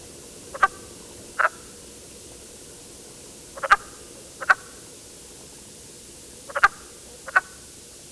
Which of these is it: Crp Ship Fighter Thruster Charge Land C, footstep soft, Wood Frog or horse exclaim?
Wood Frog